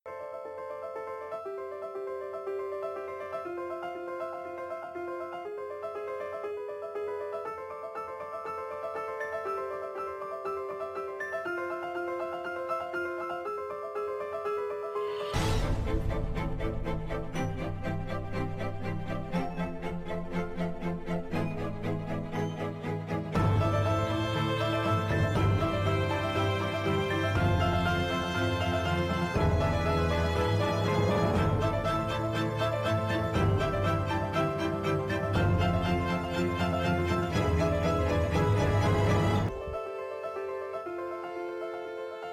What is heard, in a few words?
music; kletke